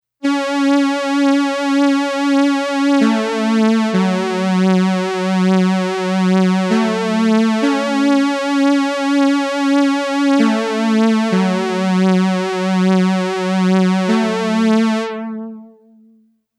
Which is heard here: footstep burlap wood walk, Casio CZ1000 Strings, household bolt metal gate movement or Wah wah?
Casio CZ1000 Strings